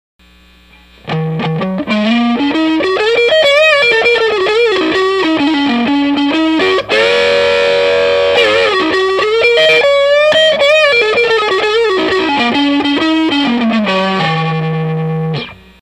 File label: tele front; TELEフロントピックアップ; Monkey Drive; JCM900コンボの クリーンチャンネル